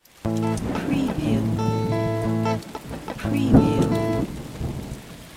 Rhythm 6-8 Slowrock Am 120bpm No Effect, electric guitar; beatcraftpro